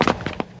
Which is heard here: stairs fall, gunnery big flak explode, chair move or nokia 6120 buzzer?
stairs fall